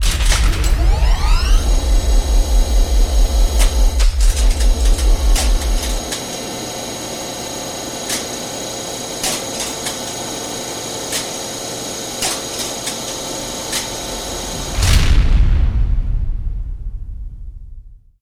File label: Elevator